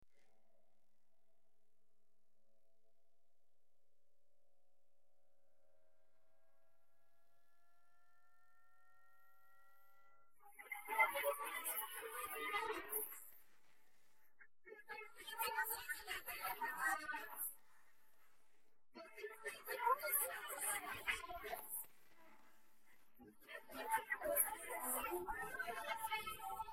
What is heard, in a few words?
vocals noise